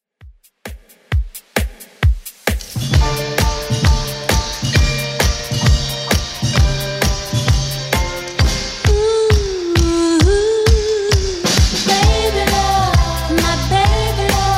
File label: 60s, 132bpm; Redrum